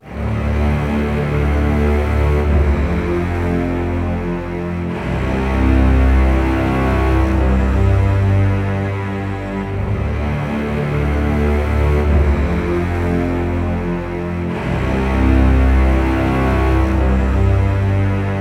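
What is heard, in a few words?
Basses - D minor - 100BPM; Construction Kits; STEMS; Ghosthack; SkyRealm; Kit 17 - Forgotten Glory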